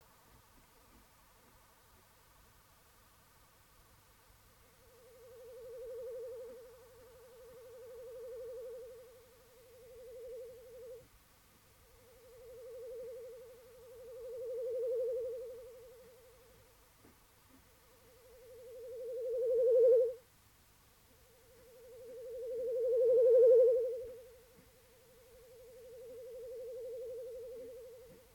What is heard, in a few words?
instrumental